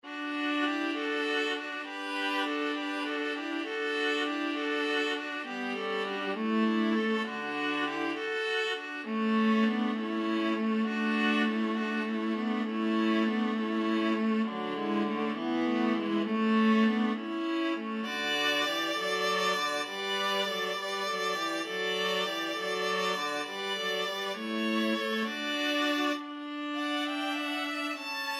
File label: pastorale, viola trio